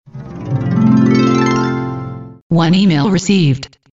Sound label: one email received